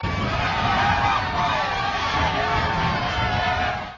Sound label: coaster